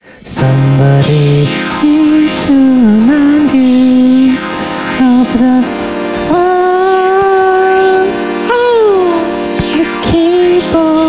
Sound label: the keyboard